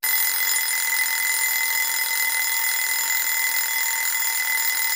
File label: alarm; raju